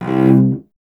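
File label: strings cello C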